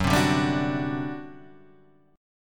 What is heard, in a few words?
G Major 7th Suspended 4th, Gb